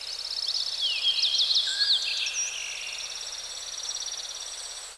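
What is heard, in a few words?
distant birds, forest; ambient; Half Life 2; Episode 2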